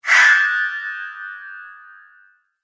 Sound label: Chime Whoosh; Whoosh Sound Effects; sound design